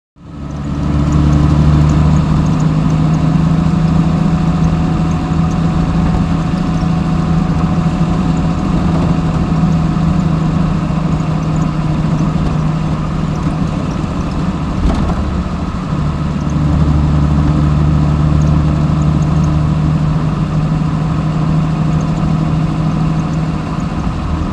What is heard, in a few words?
Automobile; Interior; Interior Austin Sixteen Constant Speed, Then Gear Changes. Sounds Pretty Slow, Bumpy And Rattly, Finally Stops, Idles And Switch Off.